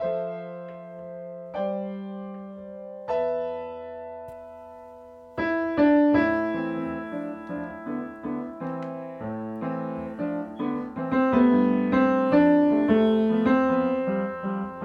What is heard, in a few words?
vocal piano